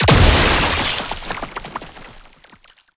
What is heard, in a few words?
Entangle Projectile Explosion; Warframe; Oraxia